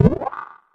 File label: spaceship door